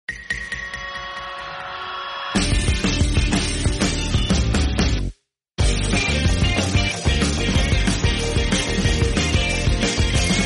instrumental

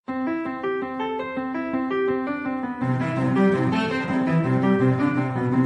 iphone 13 pro max

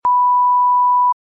cool long beep

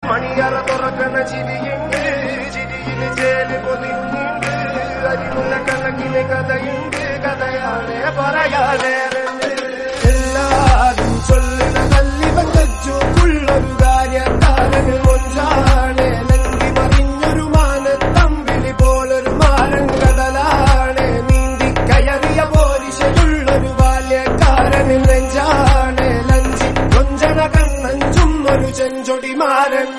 promo song Malayalam; thallumaala

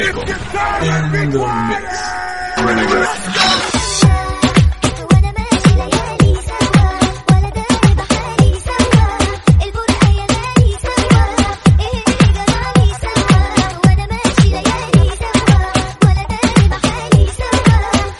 remix

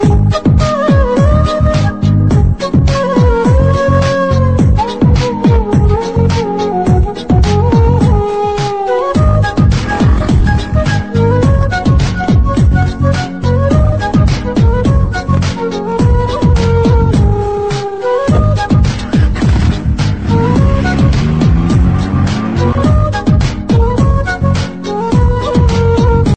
kushi flute

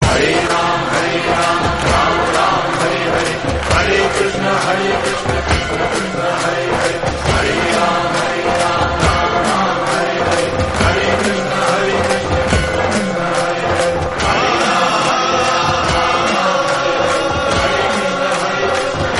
hindi; vastav

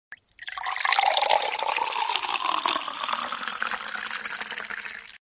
water pouring in glass notification

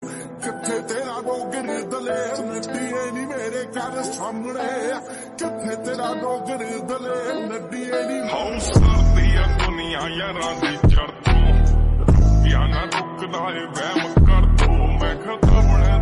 slowed reverb punjabi song

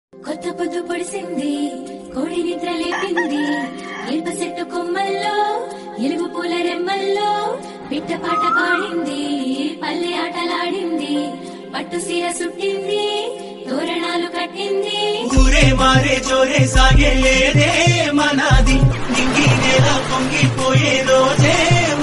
ugadi songs telugu

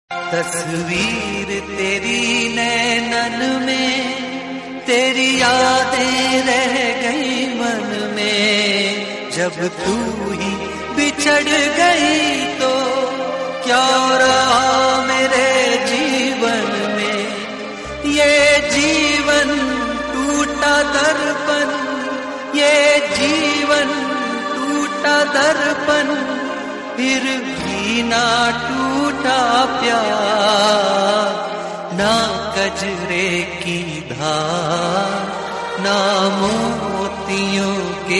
hindi old song

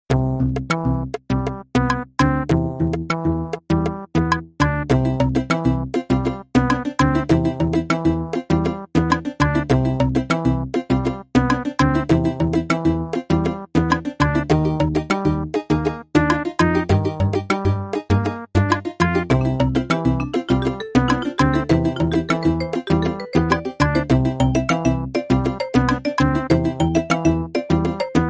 game bgm theme; bgmi

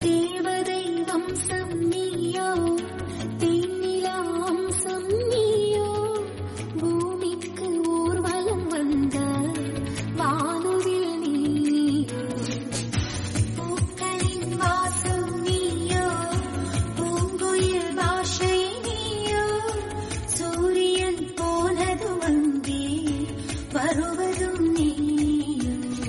girl friendship song tamil

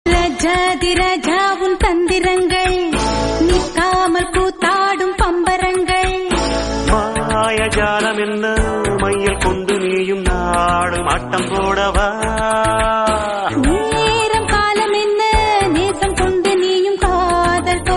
song tamil

